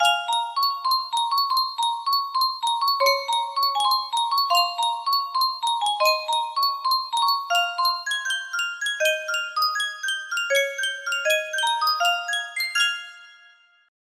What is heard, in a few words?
sankyo music box, melody